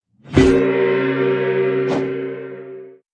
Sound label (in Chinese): 对局开始, 欢乐麻将